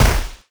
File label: yeti attack; aoe; Crypt of the NecroDancer